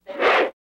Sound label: Horse Bull Shrt Snort